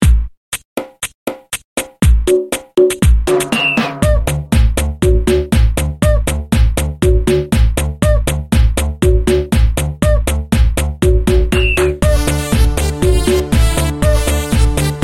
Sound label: background music, instrumental